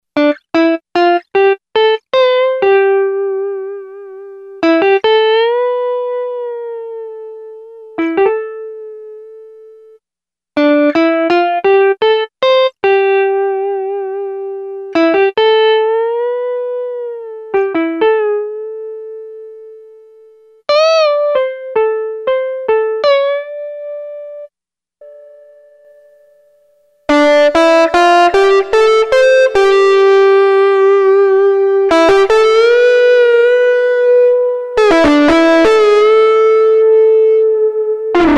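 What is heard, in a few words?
distortion + reverb plate, Yamaha REX50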